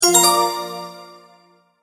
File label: multimedia tone start